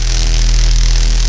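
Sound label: spell shock travel loop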